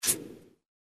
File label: highlight